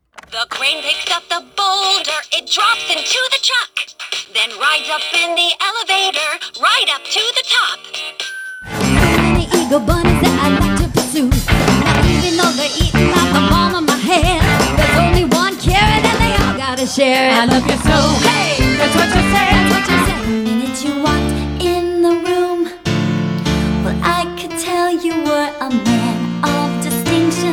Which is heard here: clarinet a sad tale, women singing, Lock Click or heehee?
women singing